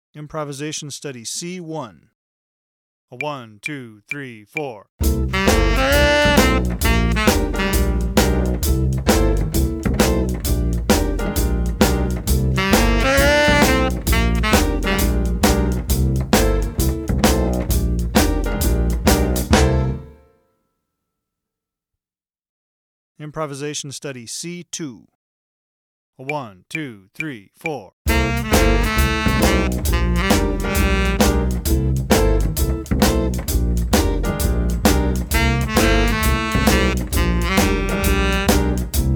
shuffle improvisation

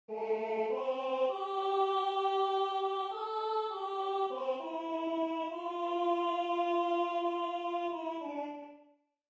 soprano

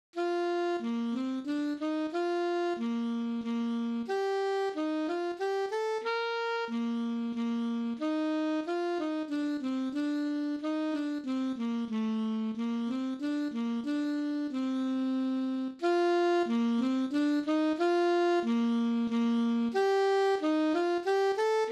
minuet for alto saxophone alto sax only

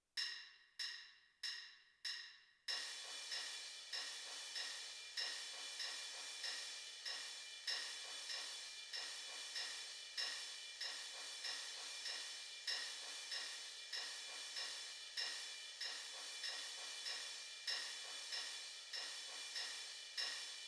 suspended cymbal

